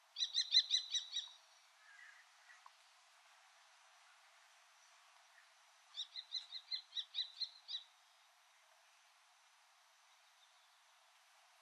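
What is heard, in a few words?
chogenbo, Common Kestrel; hyogo; koe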